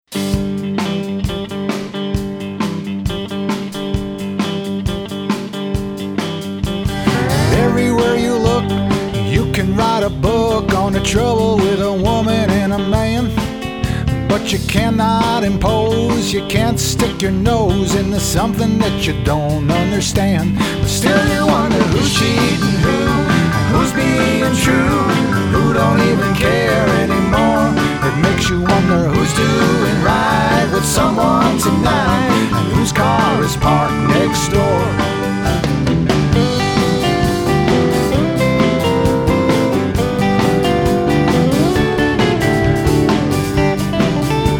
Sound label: country music band